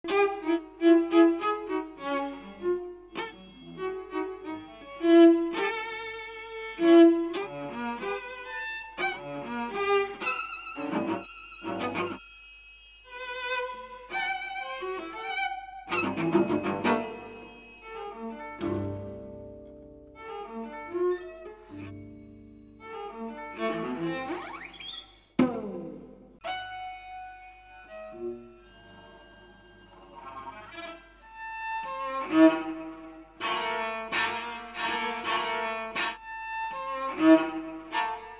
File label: classica